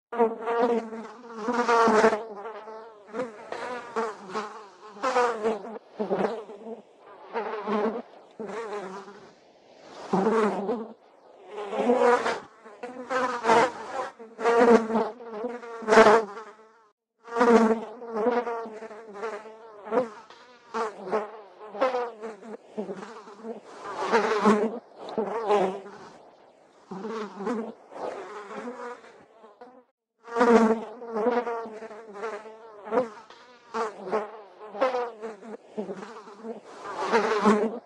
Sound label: 3D fly buzzing